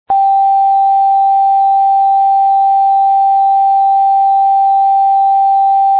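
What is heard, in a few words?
G natural 2, recorders; tuning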